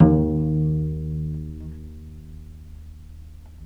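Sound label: VIOLE PIZZICATO D#1, Historical Instruments; USB Soundscan vol.23; AKAI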